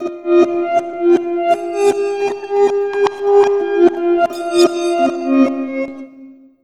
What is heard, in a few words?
STRINGS, REVERSE FX; USB Soundscan vol.60; Asian Traditions; AKAI